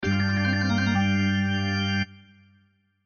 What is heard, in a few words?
Organ Blues Fill; Chord 5Th; Keyboards; Blastwave